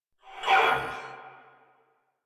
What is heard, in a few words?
Attack Effort; Warframe; Dax Knight; en